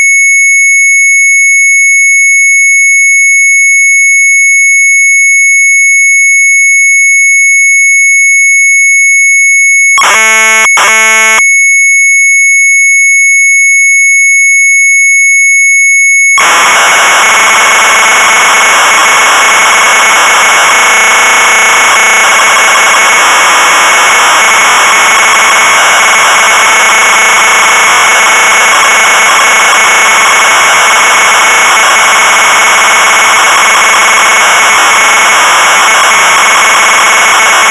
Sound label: Sharp MZ800 Tape; onze vogel; retromelsarchive